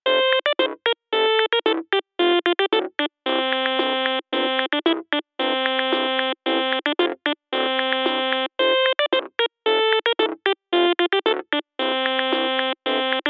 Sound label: Boop Jingle